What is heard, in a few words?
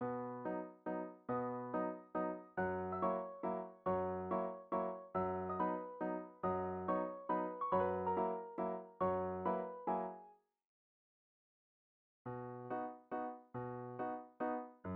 playalong B maj, cello